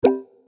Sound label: Rollover; kritter krawler